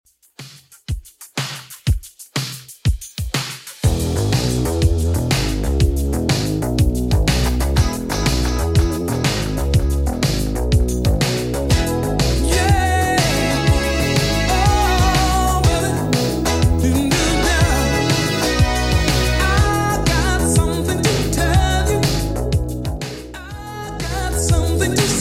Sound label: 80s Redrum 122 bpm